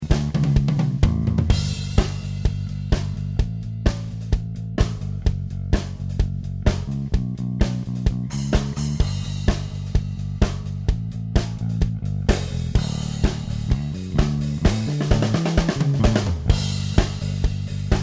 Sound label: Dr Bass